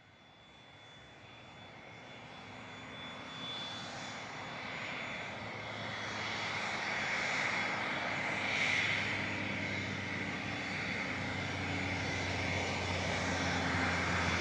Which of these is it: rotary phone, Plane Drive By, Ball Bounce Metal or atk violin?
Plane Drive By